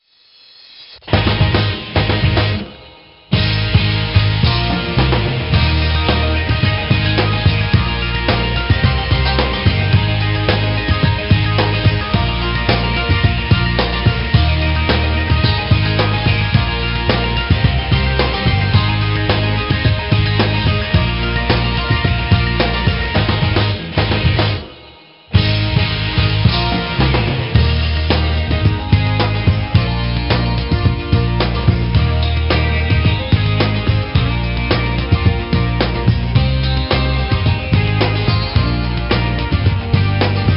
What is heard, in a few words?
Rhythm Easy; Winx Club Secret Diary 2009; NDS